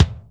Kick, Akai MPC-500; Toolkits; Kick TKit

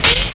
DIRT HAT; Phat & Phunky; East West

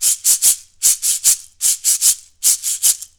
Indian Traditions; USB Soundscan vol.45; AKAI

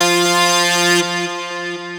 Lead - F

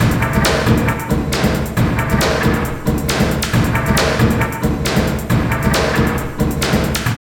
LOOP, Tekno Industrial; East West

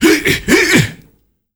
HIEH HIEH, World Voices, AFRO; ILIO; Vocal Planet VOL-5; AFRO NOISE

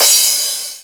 CRASH, Drums Dance & Groove; USB Soundscan vol.11; AKAI

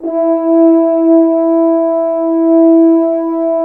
BRS, FHns Dry; Roland L-CD702; F.Horns 2

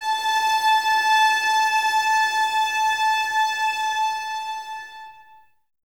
String Ensembles, Cellos, No Vib; Miroslav Vitous

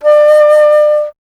Alto Flute

